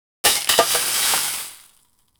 vegetables thrown on a ve, cooking

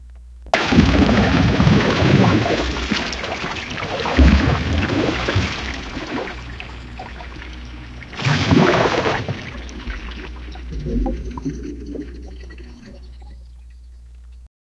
deep heavy splash with, swamp

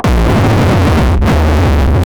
burst party pop

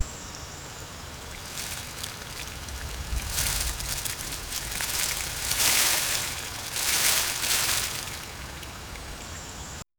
the sound of rustling lea, forest

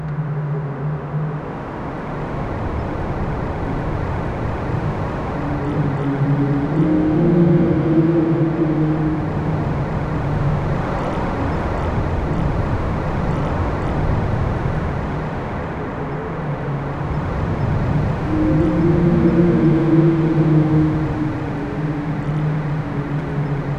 a desolate soundscape of; ruined

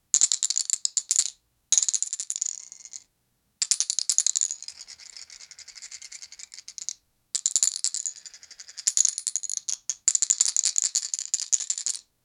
rolling dice rattle, casino

